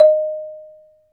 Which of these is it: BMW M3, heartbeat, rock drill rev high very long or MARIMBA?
MARIMBA